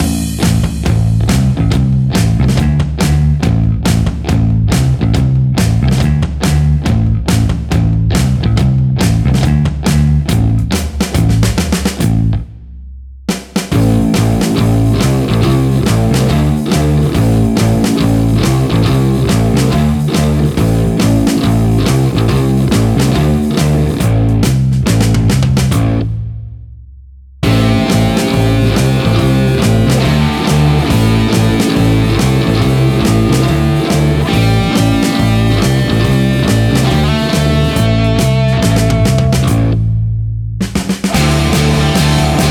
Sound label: Music Fuel Collect; DSC RedWings Music FuelCollect